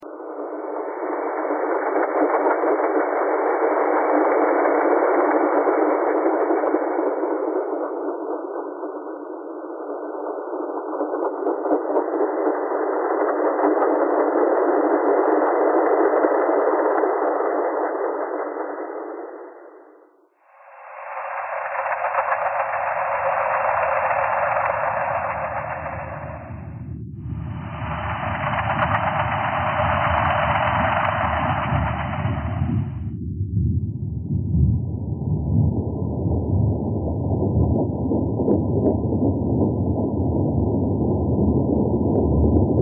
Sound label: thread pentatonic